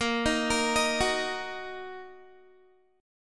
Bb Suspended 4th Sharp 5th Bb down Guitar; arpeggiated; tuning 4E,4A,5D,5G,5C,6F

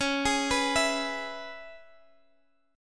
C sharp Minor 7th C sharp Guitar Half Step Down

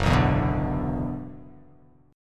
F sharp 7th Suspended 4th Sharp 5th F sharp down

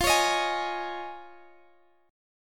F Minor 7th Sharp 5th; Fm7#5; strummed; x,x,15,18,16,16

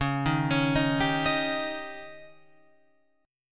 Db Suspended 2nd Flat 5th, Guitar, Open F; arpeggiated

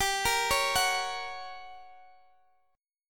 G Minor Major 7th Flat 5th, Guitar, Drop D; chordshape; down

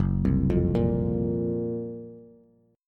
Gb Major 7th Flat 5th Bass; GbM7b5 arpeggiated; JGuitar

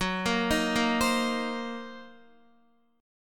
Gb 6th Flat 5th, down, Guitar; arpeggiated; Gb6b5; JGuitar

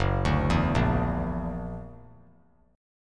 F sharp Augmented 7th F sharp, chord; F#+7 arpeggiated; 6,9,7,8

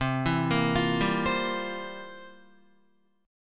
C 11th C down Guitar; C11 arpeggiated

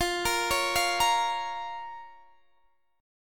F Suspended 4th Sharp 5th F

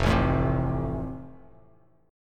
F Major, Bass Standard; strummed

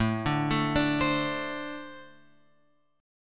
A-Minor Double Flat 5th A; Ambb5 Chord {5 5 7 7 X 8} arpeggiated

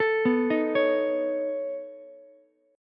B 9th B down Ukulele C6; B9 arpeggiated; JGuitar

